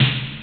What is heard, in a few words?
Bump; Concentroids From Planet X